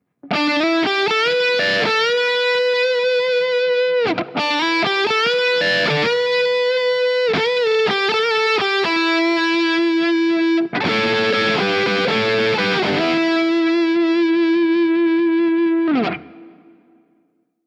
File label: gt drive cable lead